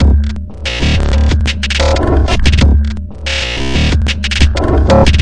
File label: growling distorto electrics, drum loops and breaks, 92 bpm; 091 to 100 bpm